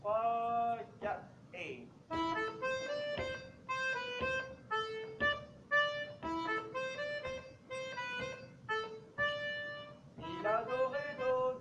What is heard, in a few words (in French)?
Voix 2 pour violons; Nouvel Andro